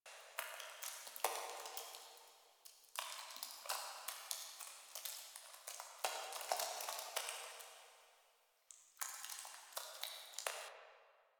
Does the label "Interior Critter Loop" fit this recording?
Yes